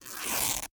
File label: FABRIC Tear; FABRIC & CLOTHING; mono; Universal Sound FX